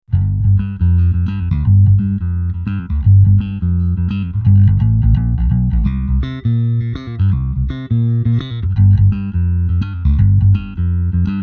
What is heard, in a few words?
bassman; zoom b3